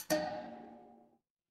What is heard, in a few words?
door doorbell